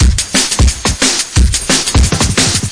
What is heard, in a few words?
uk program, drum loops and breaks, 178 bpm